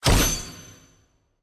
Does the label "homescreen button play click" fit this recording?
Yes